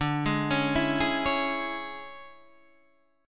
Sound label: C sharp Minor D, chord; arpeggiated; JGuitar